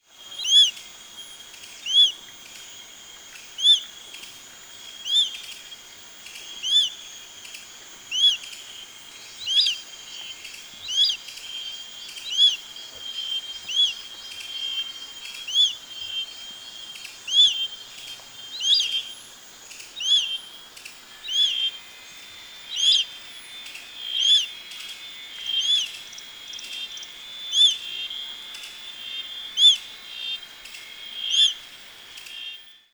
buse blanche

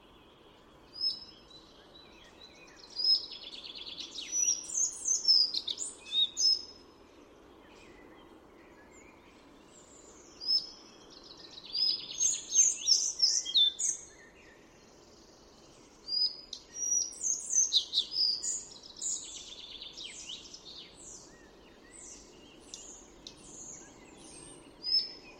gobemouche a collier; chant

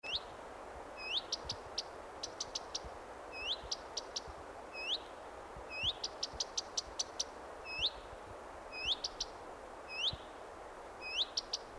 rougequeue a front blanc

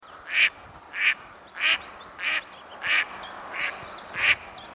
canard colvert

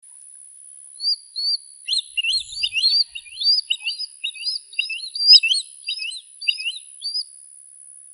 milan a queue fourchue, chant

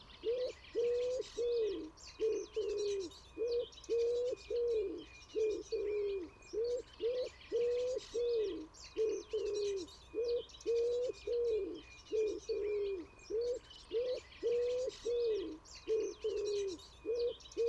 pigeon ramier; chant

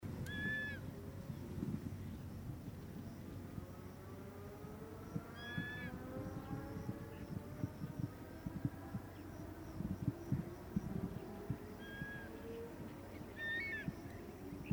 circaete jean-le-blanc, chant

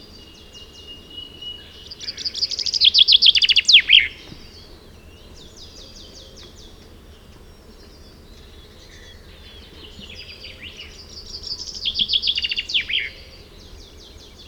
pinson des arbres

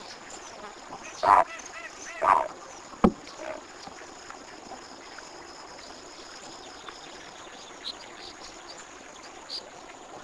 heron cocoi, chant

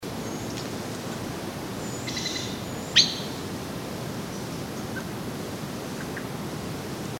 merle noir, chant

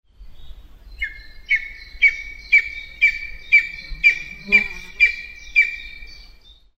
aigle pomarin, chant

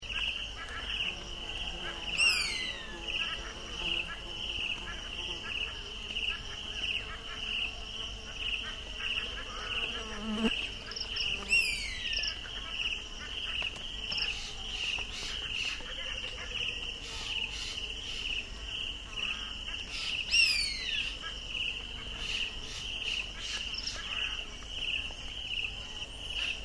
autour tachiro, chant

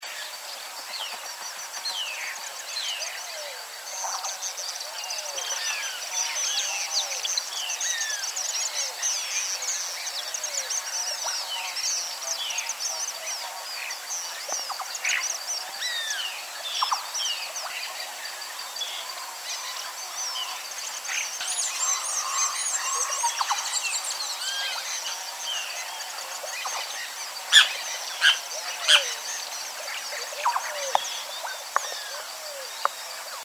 martinet de cayenne, chant

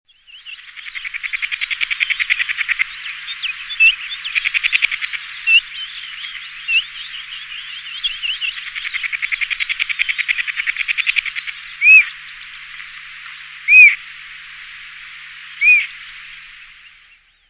elanion blanc, oiseaux chant

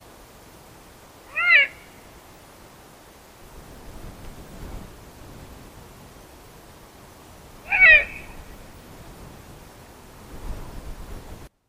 grand-duc d europe, chant

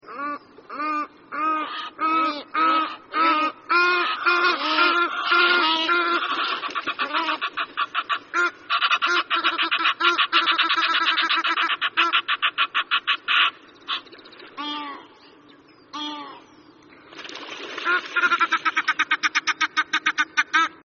ouette d egypte, chant

